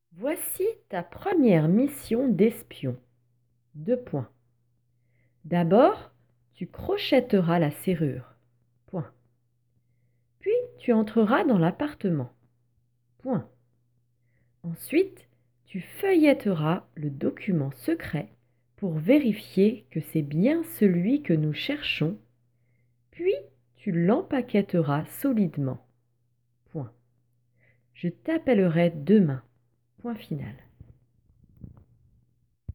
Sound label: Vocal